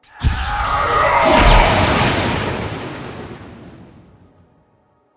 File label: zombie lord attack, monster